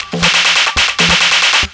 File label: drum 138, drum-loops, loop-grinder, Techno; Techno ID